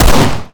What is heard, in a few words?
fire, glock; Urban-Coalition; Decay; arccw ud